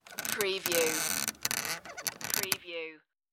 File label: creaky wooden hut door